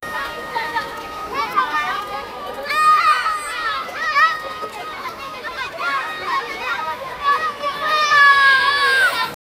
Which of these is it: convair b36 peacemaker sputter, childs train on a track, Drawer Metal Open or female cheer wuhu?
childs train on a track